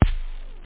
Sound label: bdrum; OctaMED SoundStudio V1; jazzies samples